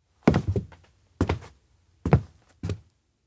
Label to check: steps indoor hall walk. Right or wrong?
Right